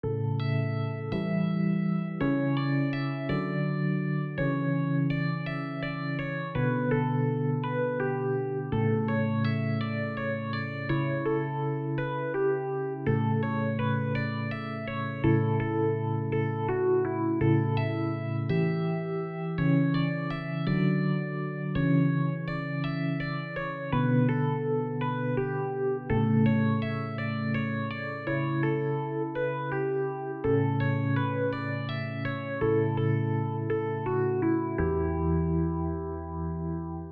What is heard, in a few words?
piano